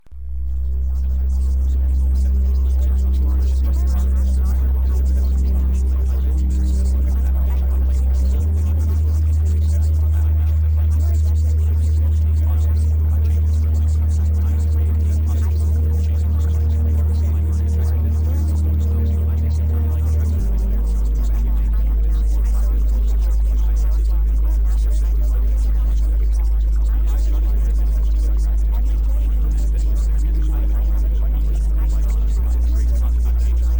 Music

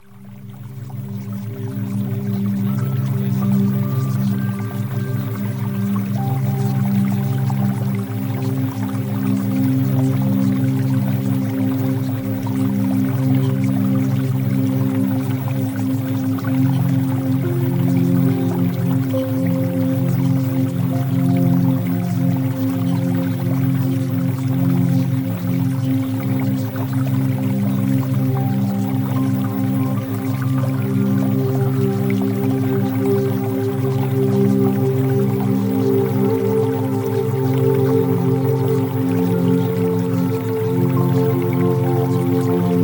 Music Theta Waves

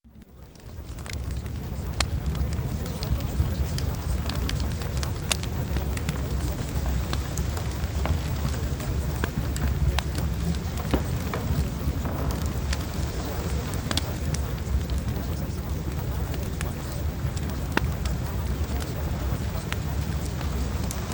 Fireplace; Male Beauty 2.0

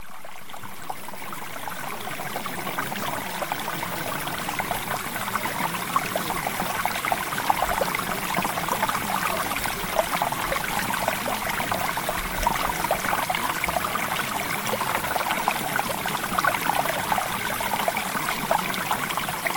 Water; Premium Subliminals

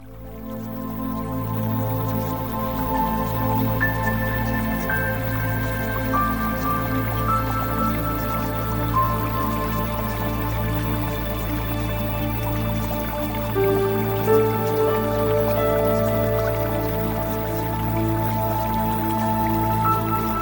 Music; Premium Subliminals

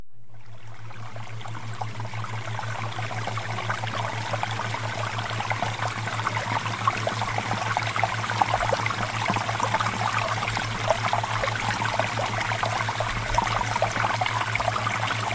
Water Theta Waves

